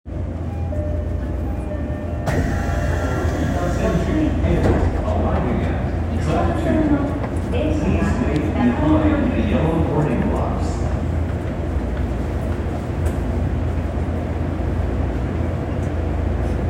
日比谷線八丁堀南

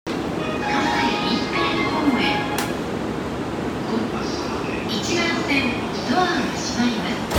JR京葉線葛西臨海公園東, train sounds

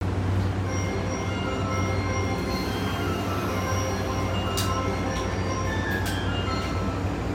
中央・総武線新小岩西, train sounds

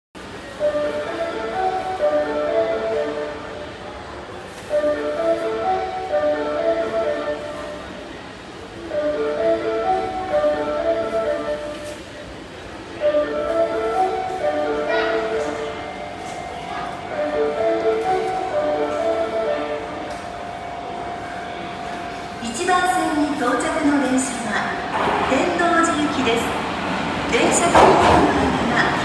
大阪メトロ御堂筋線なんば南接近

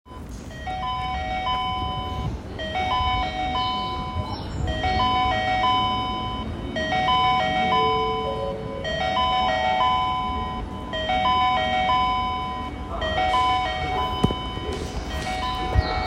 京急本線立会川北通過予告

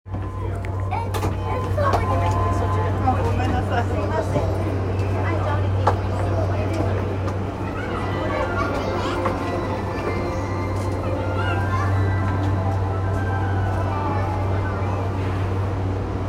JR 山手線 高輪ゲートウェイ CW, train sounds

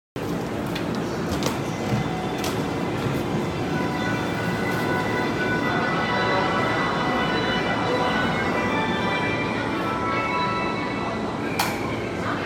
東海道線 大船 南, train sounds

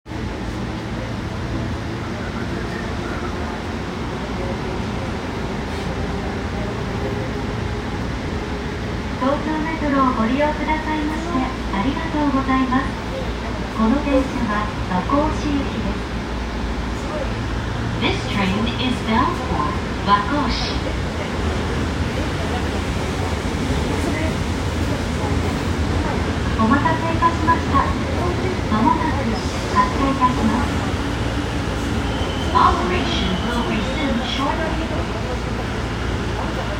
有楽町線新木場西, train sounds

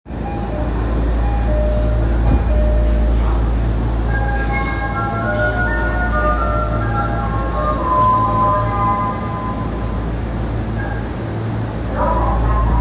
丸ノ内線新中野東, train sounds

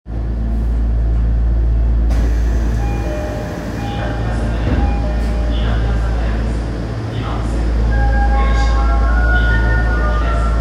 丸ノ内線南阿佐ヶ谷東, train sounds

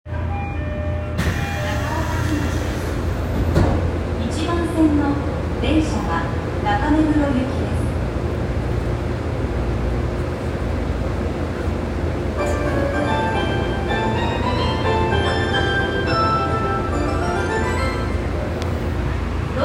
日比谷線中御徒町南, train sounds

